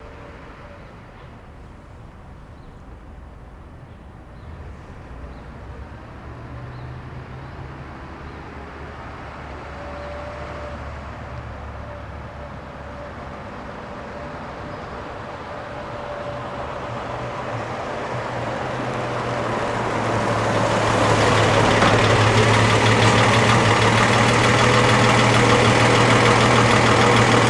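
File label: Chevy Truck 46 Long Idle Off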